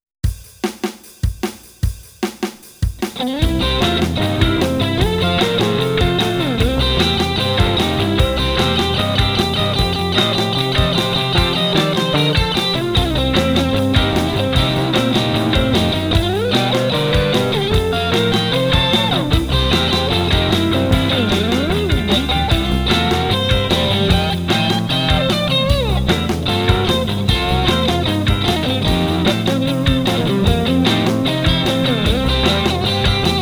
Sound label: rock n roll